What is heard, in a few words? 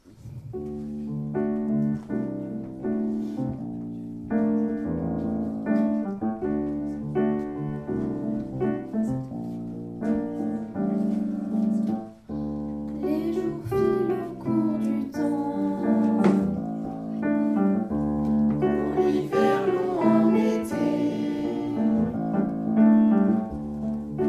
jazz